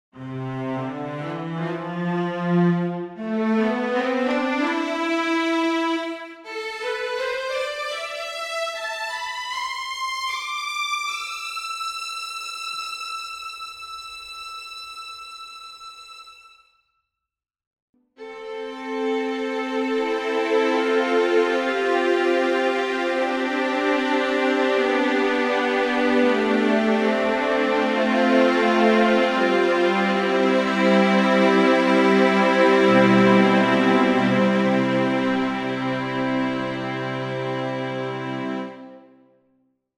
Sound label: Chamber Strings Ensemble Patch